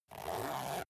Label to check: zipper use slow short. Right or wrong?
Right